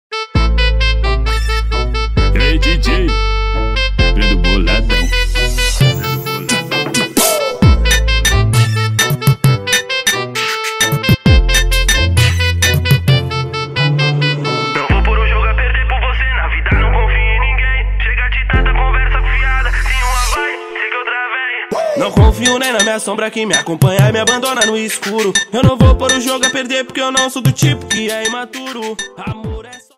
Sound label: Cello